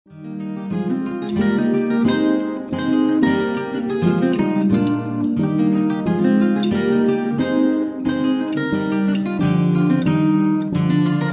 Acoustic Guitar

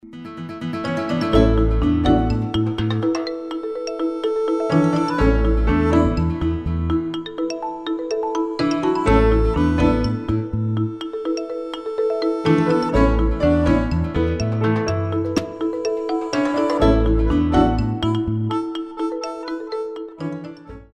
New age Contentment

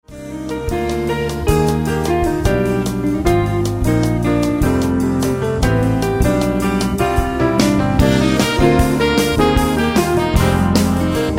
Modern Jazz

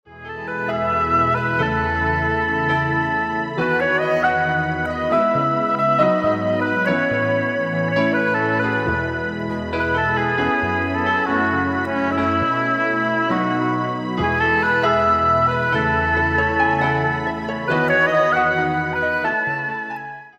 Warm Caring

